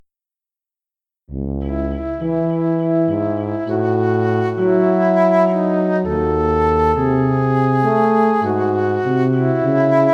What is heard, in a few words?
Tango for Flute and Tuba; Music from Roanoke